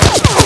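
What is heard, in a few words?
ricochet; weapons; Counter-Strike Pack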